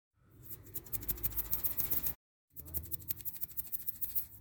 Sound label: The Sand